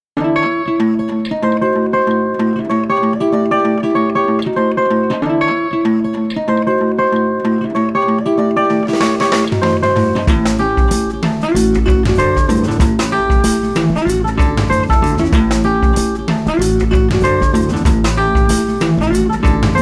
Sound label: Street Trio Funky Rag